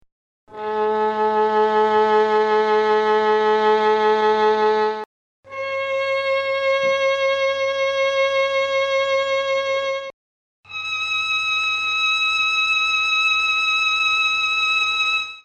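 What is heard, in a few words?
violin E4, chamberlin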